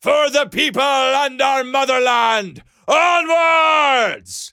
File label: charge; blugoslavia